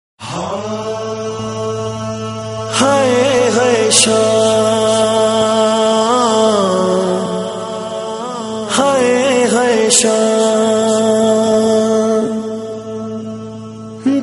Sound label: Punjabi Noha; Muharram Nohay